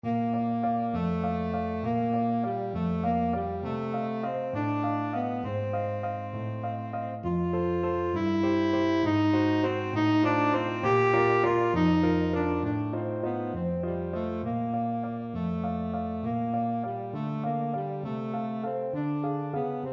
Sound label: tenor saxophone